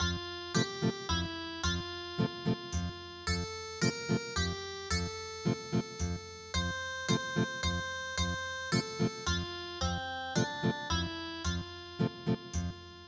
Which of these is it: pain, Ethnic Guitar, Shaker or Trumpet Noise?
Ethnic Guitar